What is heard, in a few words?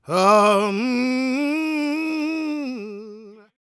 GOSP MALE, Vocal Gospel; Vocal Planet Vol1 Gospel